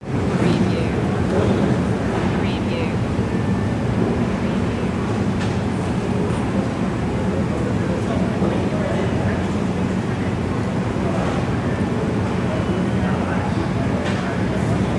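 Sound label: Airport Ambience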